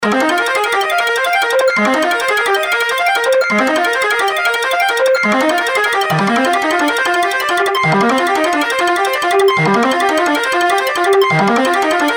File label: Lead